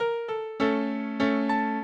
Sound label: minuet, mozart